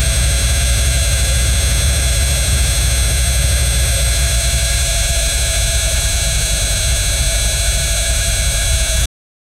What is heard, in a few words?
a continuous suffocating, strained